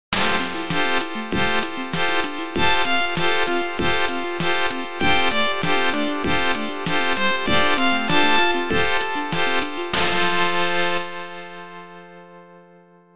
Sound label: Unused Jingle; U.S. Championship V'Ball; arcade